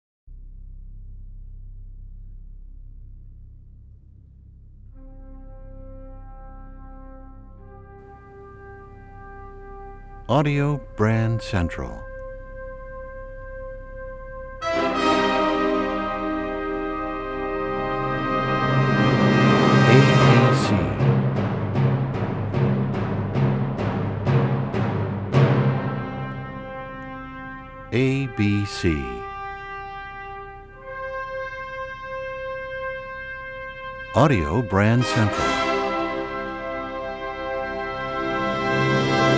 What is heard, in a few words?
Strauss Thus Spake Zarathustra; ABC Classical Trax